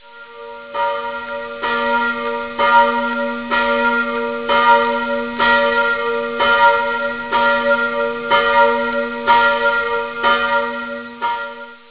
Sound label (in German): glocke, glockensound; pfarre